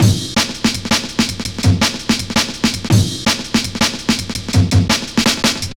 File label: Drum Loops, Jungle